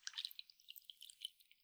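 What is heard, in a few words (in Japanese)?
くちゅ 長, 水音効果音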